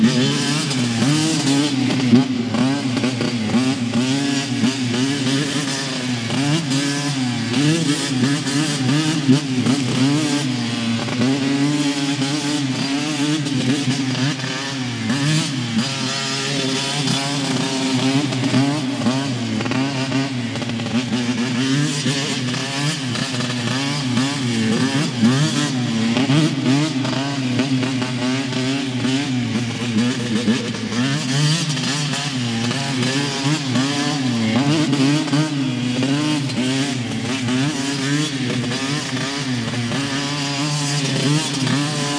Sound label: BIKE